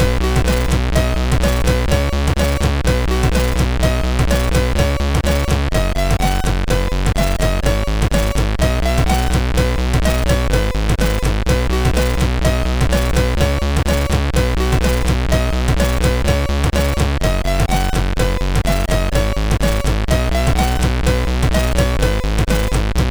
Xmas Rock, sid MOS6581R3

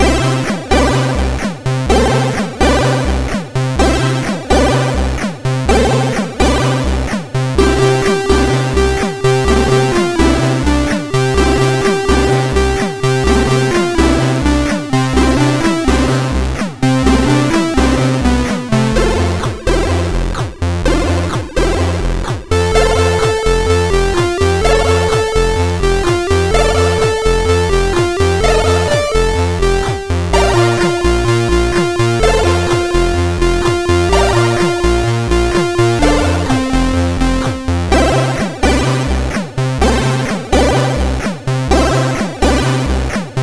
Birds, MOS6581R4; Muzak

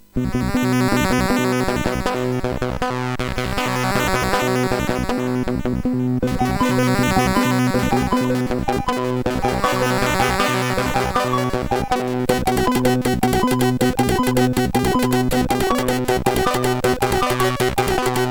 Ambient, MOS6581R4; hvsc

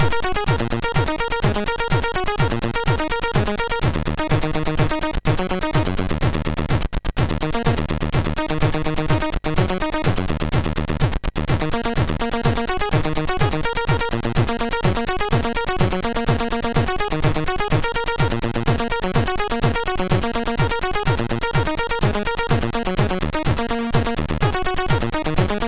Tekkno

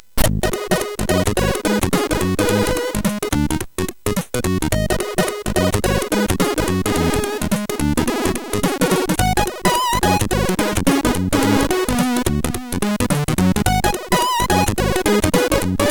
Funky, sid MOS6581R4

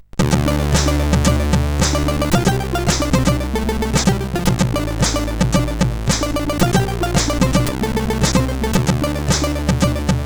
intro CSG8580R5; sid; Driven 6 intro

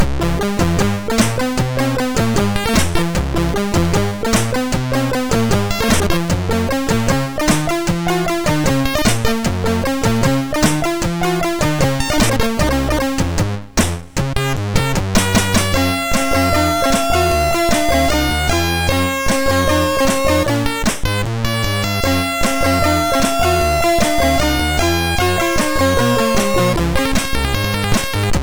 sid MOS 6581 R2

